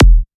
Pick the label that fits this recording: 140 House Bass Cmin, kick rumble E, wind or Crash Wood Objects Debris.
kick rumble E